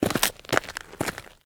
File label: calm walk; butterflysoup2